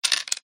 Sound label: click tile